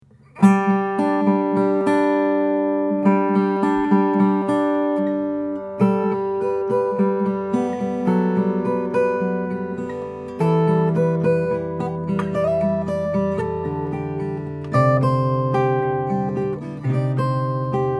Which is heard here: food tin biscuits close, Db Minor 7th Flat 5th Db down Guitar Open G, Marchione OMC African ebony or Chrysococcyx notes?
Marchione OMC African ebony